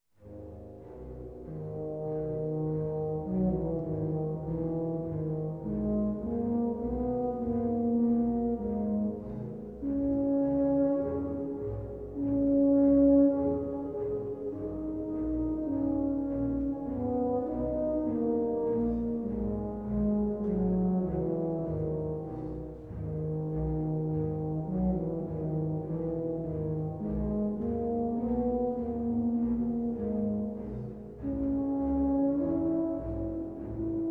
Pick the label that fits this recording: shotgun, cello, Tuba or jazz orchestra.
Tuba